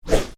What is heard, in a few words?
Swish: Short, Slow, Whoosh; Whooshes (Sound Effects:Sound Design); Stuart Duffield